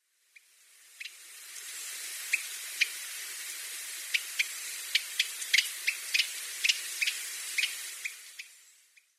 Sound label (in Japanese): karafutomujisekka; sakata